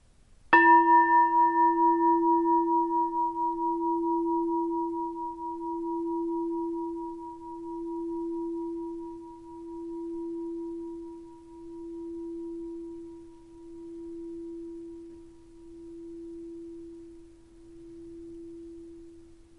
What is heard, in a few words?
Bowl